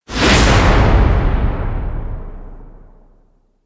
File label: Money Hit Swell; Ninja Tracks